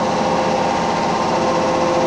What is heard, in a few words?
Compressor C2000 Loop